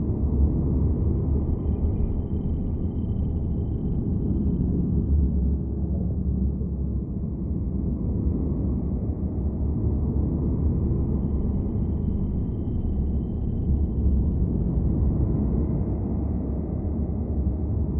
ship transport ship idle; Stellaris; aquatics; ships

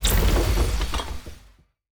artifact analysis; Stellaris; grand archive

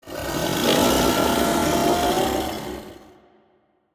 kaiju hatchling rage roar; Stellaris; biogenesis; ships

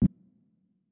weapon kaiju lightning hit; Stellaris; biogenesis; weapons

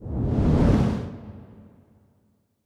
kaiju juvenile tail swish long; Stellaris; biogenesis; ships

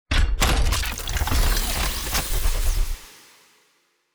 booby trap; rifts; Stellaris; astral planes

